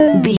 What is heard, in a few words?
retro impact hit